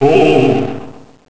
"Ohh"; Gustaf: "Ohh..."

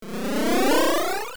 Dungeon Teleport; Teleporting to the dungeon entrance

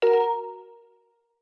Calibrate; Zelda

